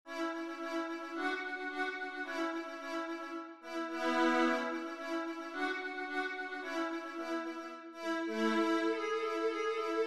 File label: fiddle viola